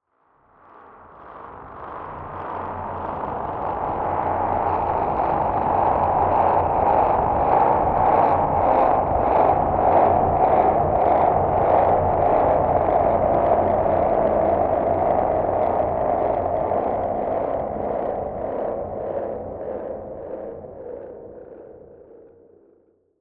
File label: suspension bridge